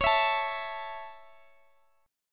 C sharp Minor Sharp 5th C sharp Guitar Standard, chord; strummed; x,x,x,18,17,17; JGuitar